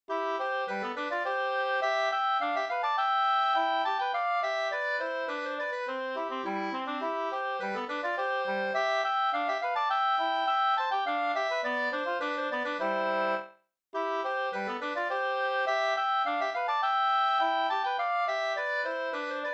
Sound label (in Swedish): polska, 2st; From-Olle Hs SvL HG83